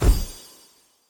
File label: Hit Morale